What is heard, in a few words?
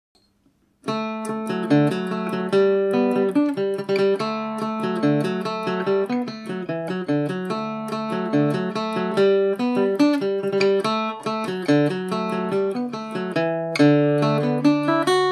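D major at 40, irish mandolin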